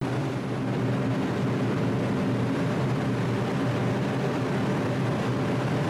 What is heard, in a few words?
R142 HVAC, Train; OpenBVE; Jerome Yard